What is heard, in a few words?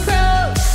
croak